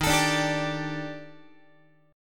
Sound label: Eb Major 7th Flat 5th Eb Guitar 4A 4E 5A 5D 5G sharp 6C sharp; EbM7b5; strummed